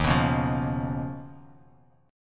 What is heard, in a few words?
Eb 7th Suspended 2nd Sharp 5th Eb, Bass Standard; 11,14,11,10; Eb7sus2#5 strummed; JGuitar